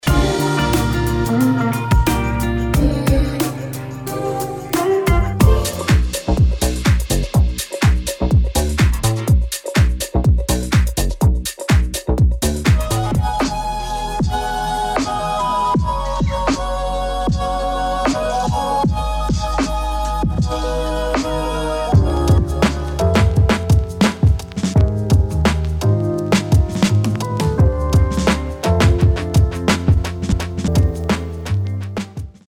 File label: Underground Beats